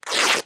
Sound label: Cloth Tears